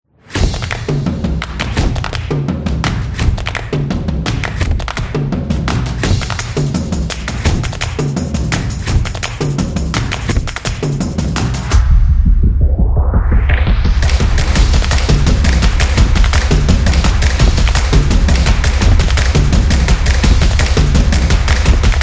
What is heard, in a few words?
Energetic Drumming